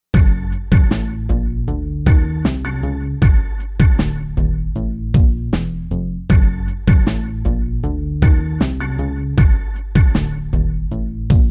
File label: AKAI XR20 Factory patterns; MURDA